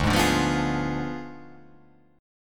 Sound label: D Diminished 7th D